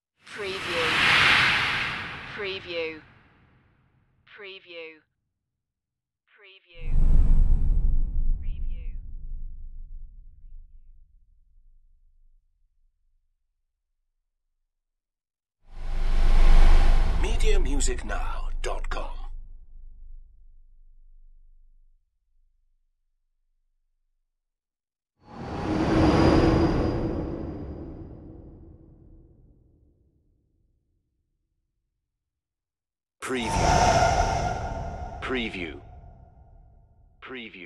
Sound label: space whooshes